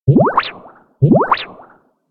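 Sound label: electronic borunborun; anemoi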